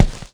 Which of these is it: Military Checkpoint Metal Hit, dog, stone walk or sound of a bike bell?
stone walk